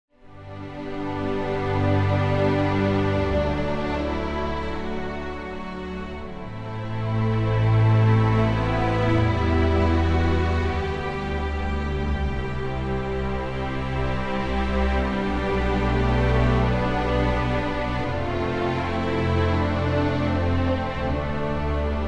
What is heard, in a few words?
Chords Only; Final Result